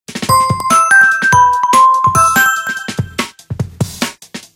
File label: Ring